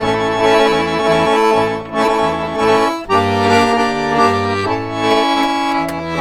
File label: ACC, Balkanish Traditions